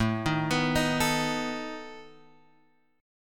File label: A Suspended 2nd Flat 5th A down Guitar Open G